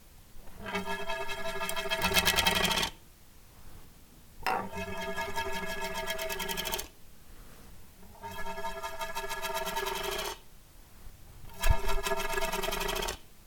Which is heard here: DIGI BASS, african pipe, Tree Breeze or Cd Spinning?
Cd Spinning